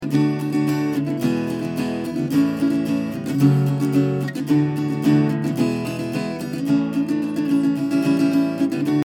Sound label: Guitar no EQ